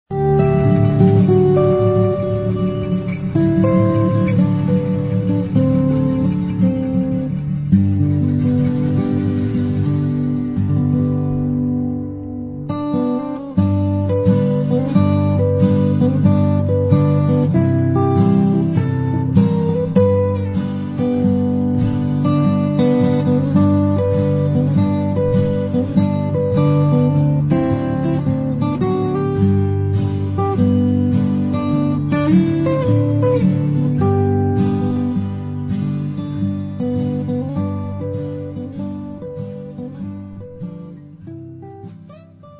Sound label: Chimes